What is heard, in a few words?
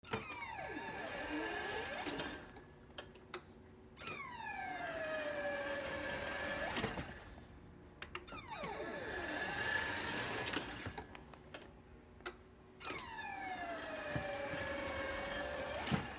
Electric Wheelchair